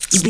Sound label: voice fun small creature movement